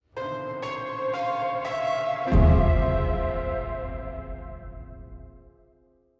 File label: Resurrection Jingle; lovecrafts untold stories